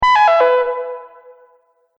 Multimedia Event Notification, beep sound effect

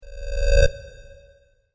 Sc-Fi Generic Game SFX; Tech; Stuart Duffield

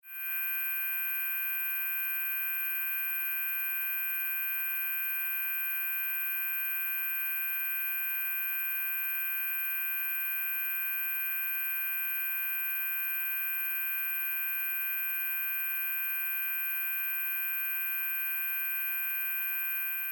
Science Fiction Ballast High Pitch Tone; High Tones; Sound Design

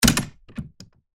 Household Cabinet Door Metal Latch Close; Cabinet Doors; Blastwave

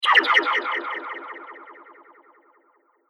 Laser Echoes; Sci-Fi Laser Sound Effect; Laser Sound Sound Effects

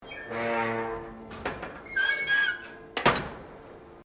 Door Boiler Close, Colonial era objects; Door 19 11 Roberts Boiler Close; Miscellaneous Doors

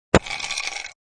Ice Shk Glass, Quick shake of an ice shaker.; Drink Mixing

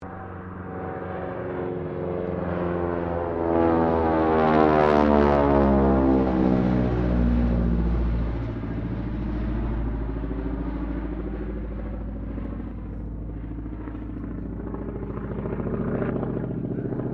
AIRCRAFT AT-6D HARVARD MK III PASS

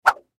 Whip Fast Whoosh Swoosh Rod Stick; Objects; Foley; Blastwave

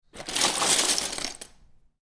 Lego Rummaging In Lego Box; Miscellaneous Toy Sound Effects; Miscellaneous Toy (Sound Effects:Toys)

